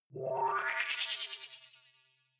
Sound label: sci-fi transmission incoming; swooshes-swishes